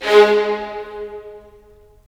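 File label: strings violins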